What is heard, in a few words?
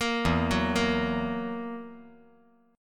E Suspended 2nd Flat 5th E down